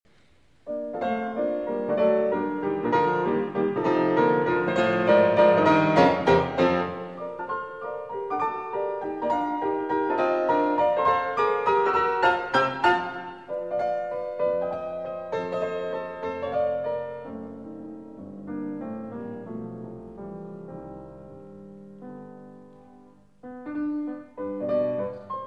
Piano Sonata No. 29 in B-Flat Major, Scherzo. Assai vivace; Op. 106; Hammerklavier